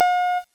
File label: pluck